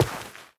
step light, footsteps, player, carpet

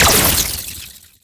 orb bolt

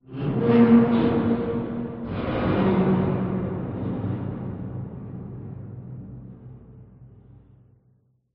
amb apocalypse wronk, ambient city

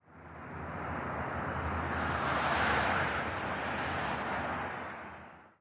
truck drive by; ambient; machines

